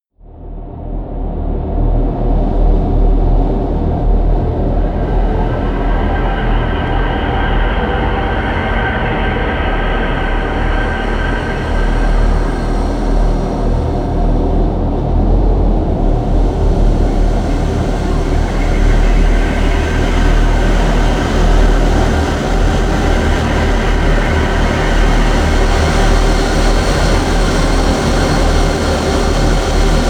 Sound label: drone howl